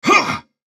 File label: effort